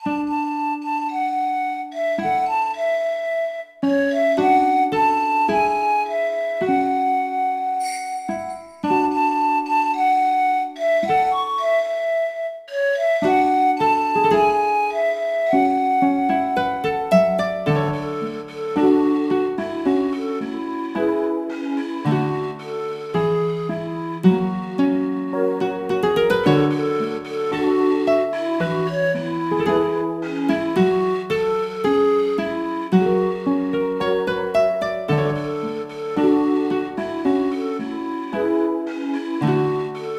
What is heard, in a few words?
The Spirit Flute; Spirit Tracks Original Soundtrack